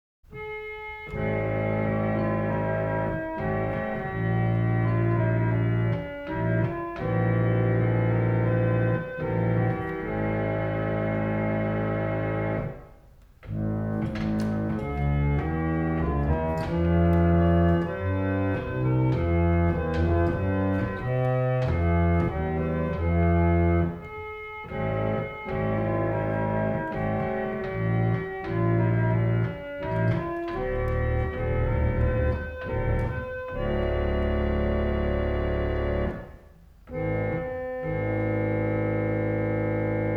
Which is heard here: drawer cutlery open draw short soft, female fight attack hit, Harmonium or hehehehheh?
Harmonium